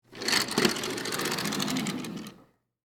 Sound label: Curtain Close, Household